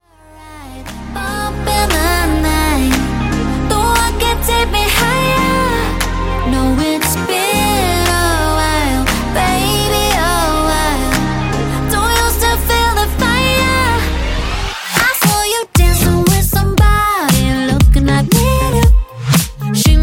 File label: Extended Redrum